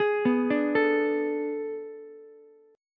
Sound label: A Major 7th Suspended 2nd Suspended 4th, Ukulele; AM7sus2sus4; arpeggiated; JGuitar